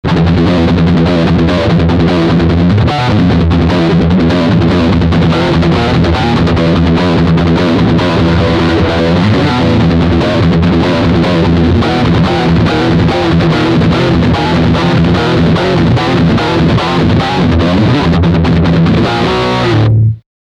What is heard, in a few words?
Riff Front Humbucker